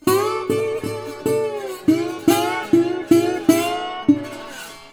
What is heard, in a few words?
Blues Guitar Samples; ILIO - Fretworks